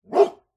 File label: animals dog medium sized single bark med close